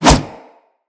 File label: Melee Kick Whoosh; Weapons